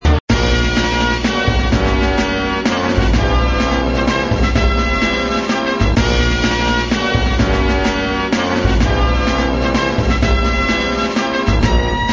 Music, flipper

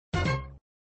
Sound Fanfare End of Ball, flipper; Fanfare End of Ball v04

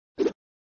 Sound Grail switch, flipper; Grail switch v1

